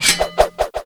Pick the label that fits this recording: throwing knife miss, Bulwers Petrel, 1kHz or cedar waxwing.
throwing knife miss